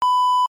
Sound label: beep